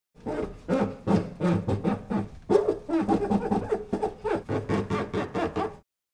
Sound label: Cleaning fridge appliance household; Household Appliances Sound Effects